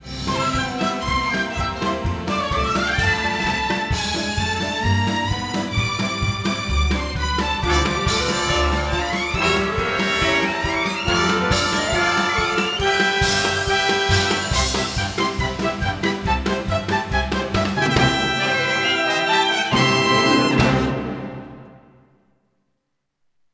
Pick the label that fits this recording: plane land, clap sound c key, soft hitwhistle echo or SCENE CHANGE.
SCENE CHANGE